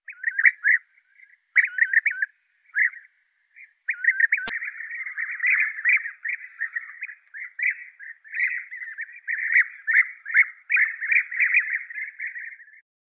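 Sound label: golden bee-eater, Merops apiaster; shurka zolotistaya; zvuki prirodi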